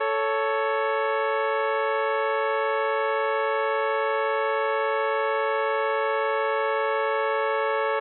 terz 440 20 cent abweichung

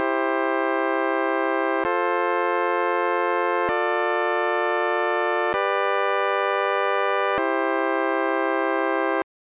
zweite stufe c g c

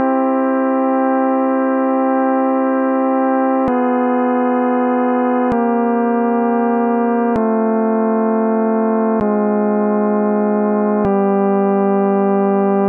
gleichstufig h 6

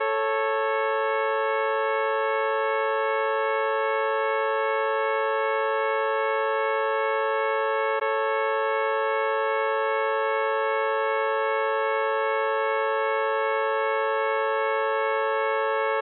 terz 440

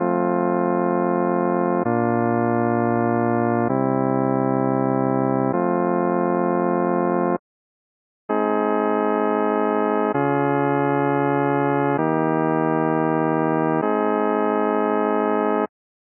c dur as dur rein

